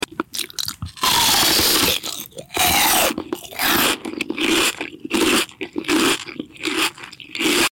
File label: loud eating sounds